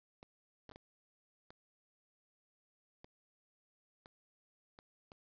noise, squid

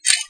m203 out

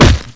fist strike

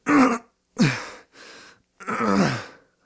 low health; police

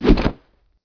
zombie swing

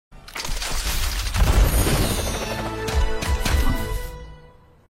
gift opening

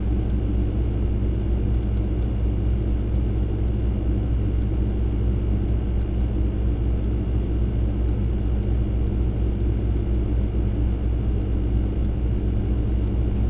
refrigerator

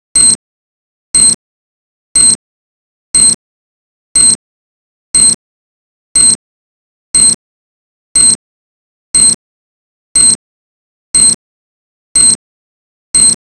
bip

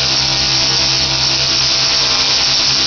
laser

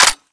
mp412 close, weapons

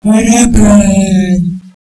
boom baraban

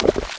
sewage small splash

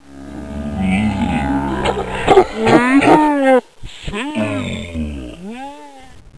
Zombie appear

